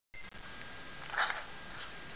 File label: impaled